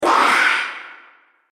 Monster Kid; horror

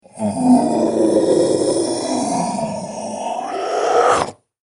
Dragon Beast, monster; horror

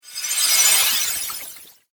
Fantasy Role Playing Game Magic Spell Fx

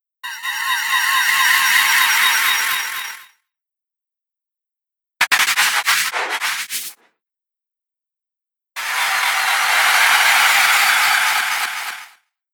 Space Zooms, whoosh; Space Zooms - Pack 3; Whoosh Sound Effects; Andrew Fleming

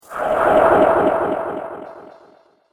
Double Delay Whoosh; Double Delay Whoosh Effect; Whoosh Sound Effects

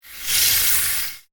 Magic The Spell Of Air; fantasy sound effect; role playing games, magic videogames

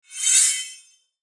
METALLIC BRIGH, Metallic Bright Scrape; Production Element Imaging Element Accent Transition; Metallic Sound; Metallic Sound Sound Effects

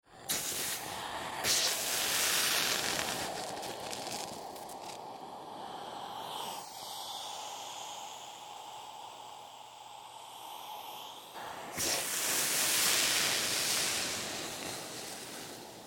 Sizzle Iron Burning Water Steam

